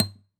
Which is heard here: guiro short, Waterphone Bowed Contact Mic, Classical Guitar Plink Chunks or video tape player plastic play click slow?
Classical Guitar Plink Chunks